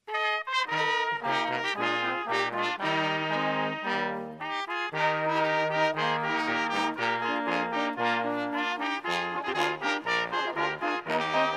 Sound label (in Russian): духовой оркестр; Кавказ - молодёжный лагерь